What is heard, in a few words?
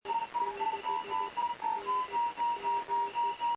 throb, digital modes